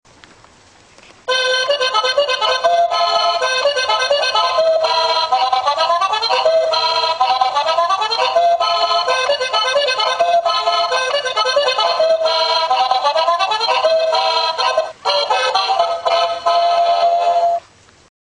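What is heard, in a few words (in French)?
marmotte dansante accordeoniste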